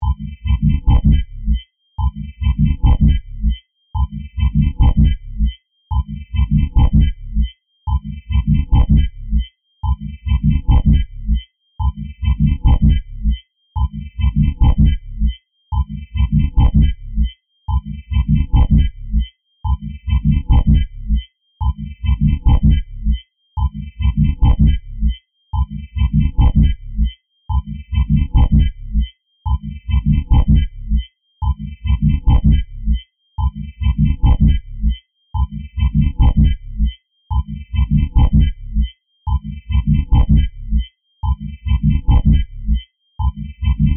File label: Alien Machine, Scifi, Alien, Machine, Science, Fiction; SoundEffects-BlastWave